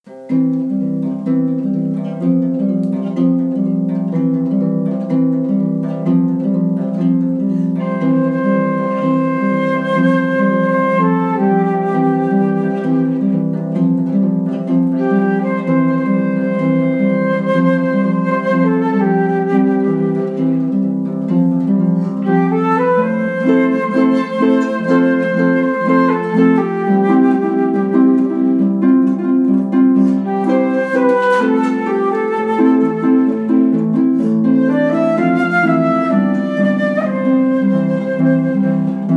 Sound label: flute and harp; Home